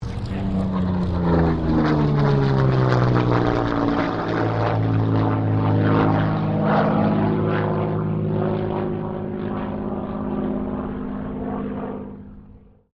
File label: AIRCRAFT P-40F KITTYHAWK PASS; 1942; P-40F Kittyhawk / Warhawk/Tomahawk; Airplanes (Sound Effects:Vehicles)